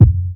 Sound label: studio kick drum f key; drum single hits one shots; kick drum samples